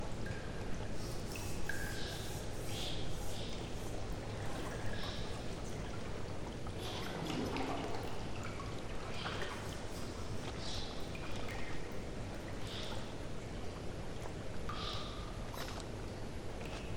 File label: ambience - batcave fight